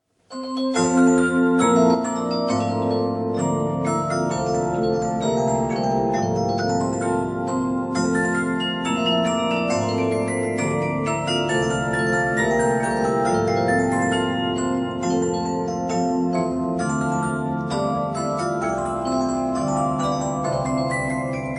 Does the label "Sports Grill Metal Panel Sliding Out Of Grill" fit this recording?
No